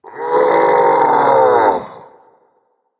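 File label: nonverbal ugh; smite; voicepack; merlin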